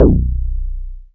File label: BAS TX-802; Best Service Gigapack 2